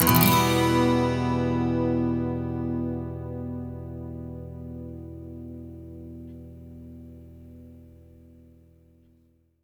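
12 string minor E, Electric & Acoustic Guitar Loops; USB Soundscan vol.04; AKAI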